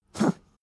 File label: pug gag short; natur-tiere; hunde